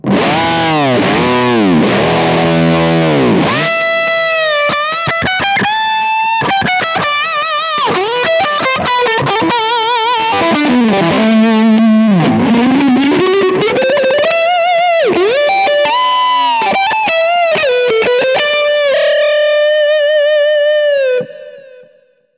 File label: Baltic Blue Fuzz